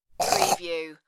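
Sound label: Quick Choke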